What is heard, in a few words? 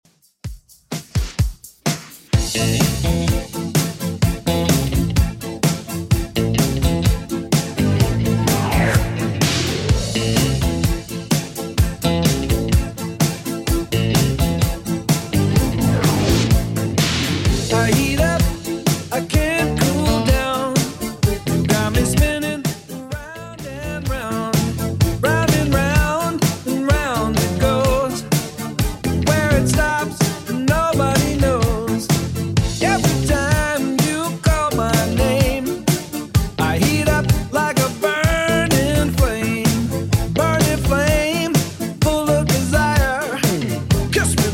80s Redrum 127bpm